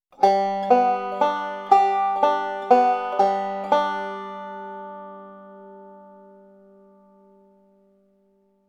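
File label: reverse roll, banjo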